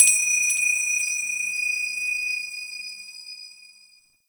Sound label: Temple High Bells; Ambience; Corpus Ship; Temple One